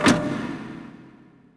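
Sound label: crane elevator stop; machines mechanical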